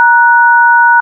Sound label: Dtmf Sharp; 1000ms